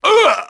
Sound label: pain, grunt; nimble grunt